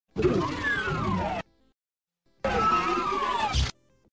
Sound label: Door; Doom; PC Gamer UK